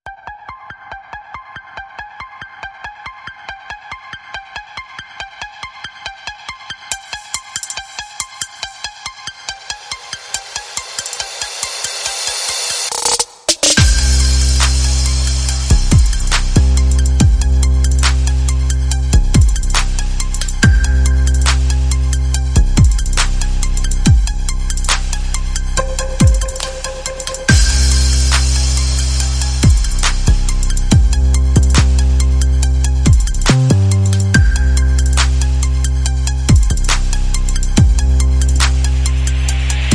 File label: No Synth Lead